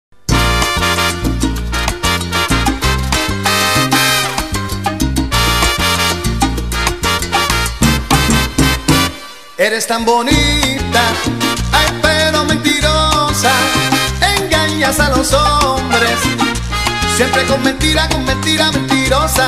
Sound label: SALSA